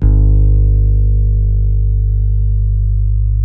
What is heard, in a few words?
H. FLS A 2, Bass; Spectrasonics; Bass Legends